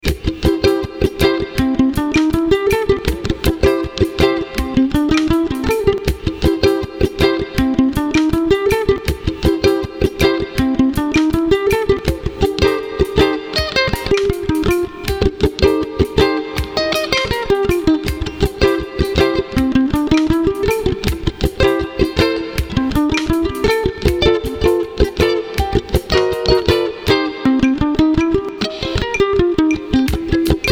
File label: Bluzuke inst